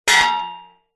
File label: sword strike metal could be shield; zapsplat